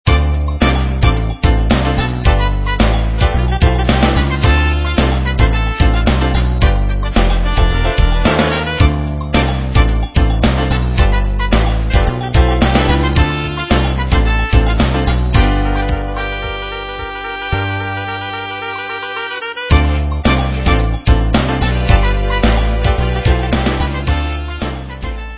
Scene Transition, transitions

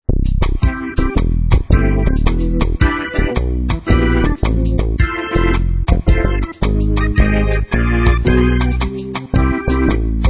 Ease Up, moods

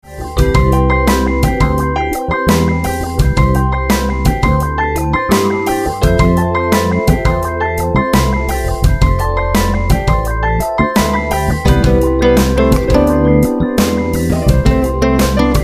Meditation

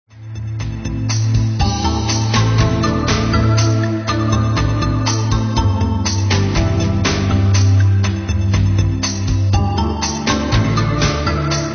Breaking Through, moods

